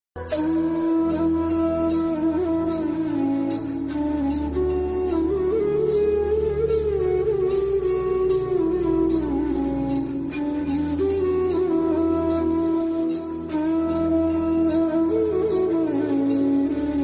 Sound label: flute